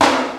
Smooth Steel Snare Drum C Key, snare drum tuned to the C note